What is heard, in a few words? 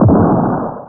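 Explosion Short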